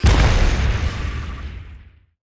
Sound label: Cuckoo Clock Cannon Fire Explode; Nova Sound